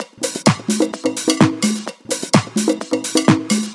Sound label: Percussive Loops 128BPM; Vengeance Samples